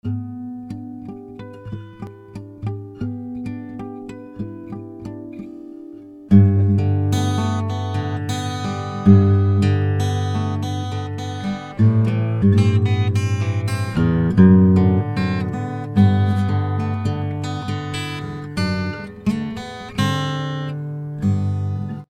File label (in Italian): chitarra